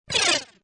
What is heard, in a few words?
Scifi Production Element Rewind; Science Fiction Sound Effects